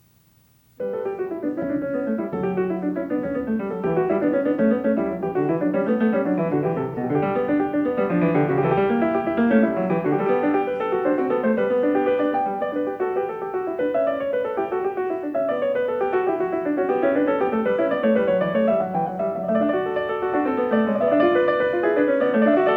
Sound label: Variation 11 a 2 Clav.; Bach; The Goldberg Variations